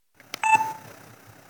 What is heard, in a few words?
camera video start; sony